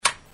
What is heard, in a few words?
Click